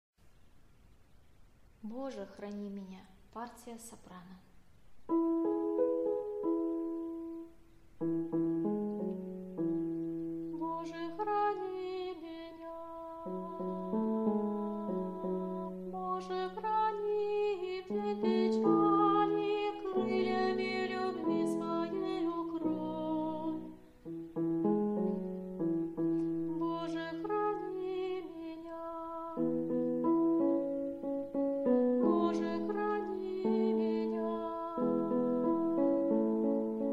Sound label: soprano